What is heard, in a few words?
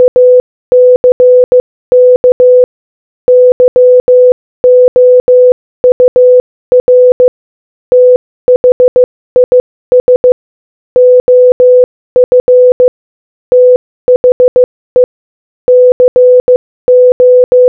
Morse Code Audio Speed 15 15